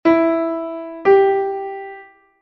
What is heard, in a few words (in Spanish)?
3ªm Mi3 Sol3; intervalos musicales; ¿Qué intervalo suena?; 3ª menor ascendente